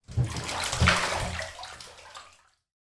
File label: household bathroom person turns round in shallow bath